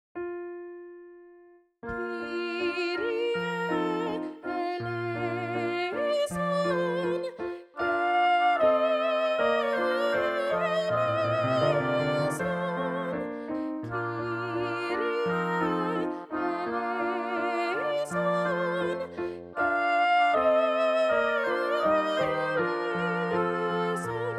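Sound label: kyrie soprano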